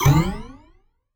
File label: Retro Jump Stereo UP Simple; Bounce Jump